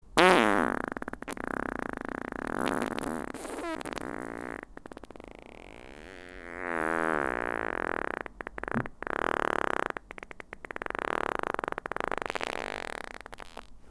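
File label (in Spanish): pedos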